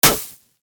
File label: Celebration pop up